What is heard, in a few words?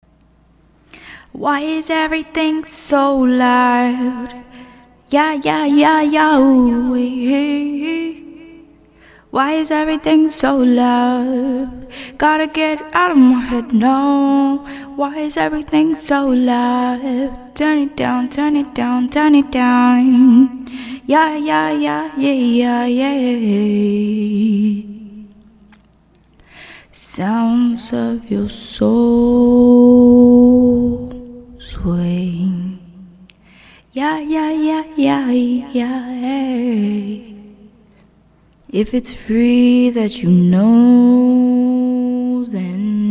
soul 120 B, Acapellas